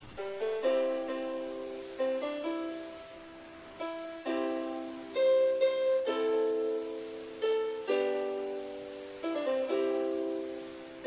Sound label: taiko